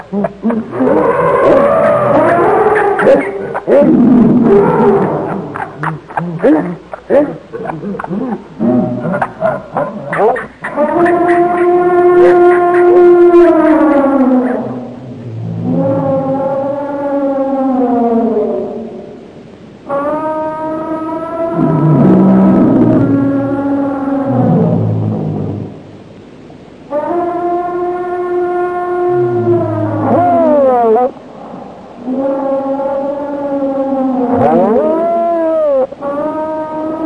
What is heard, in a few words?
zoo; Zoo and Nature